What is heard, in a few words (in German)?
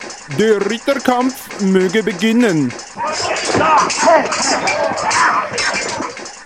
Jingle Ritterkampf